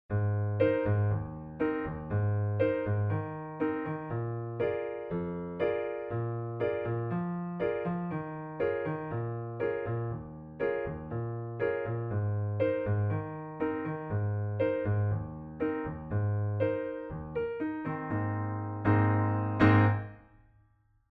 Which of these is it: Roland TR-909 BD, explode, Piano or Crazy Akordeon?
Piano